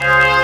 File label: Hit